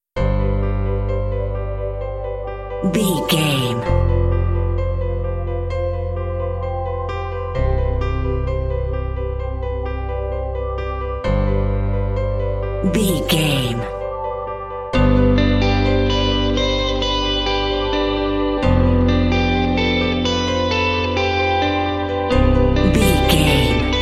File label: anthemic ocean waves no strings